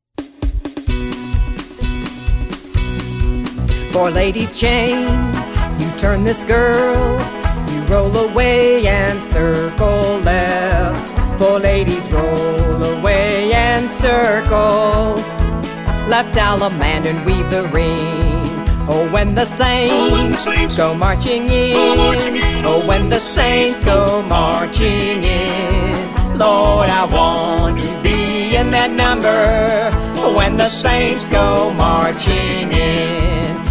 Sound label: vocal